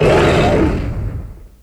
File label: pyroar; cries